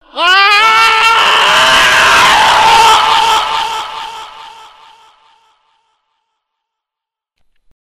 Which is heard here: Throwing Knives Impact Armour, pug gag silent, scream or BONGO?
scream